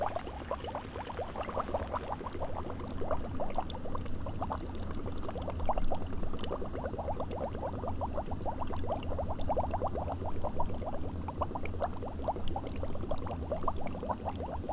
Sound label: brewing potion loop; side quest society